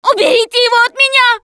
scream while pounced, russian, Survivor Voice Producer